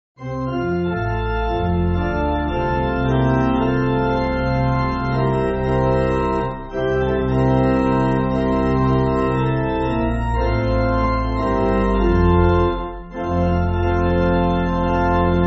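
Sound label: Pipe Organ, Backing Files for Churches; O Believers Be On; Swedish; Swedish melody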